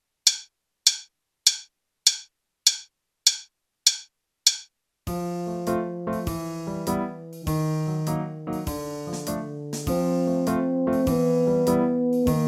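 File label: Sunshine Faster, backing tracks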